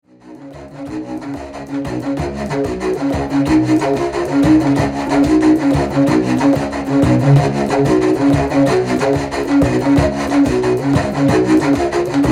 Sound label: WHEEL TRANCE, circular hocket